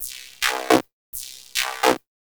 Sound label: Synth Distorted; Electrocution